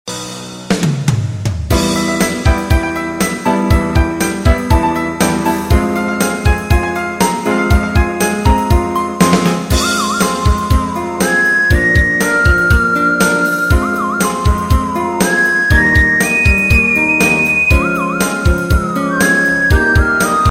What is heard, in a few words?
Digital World Sounds Fun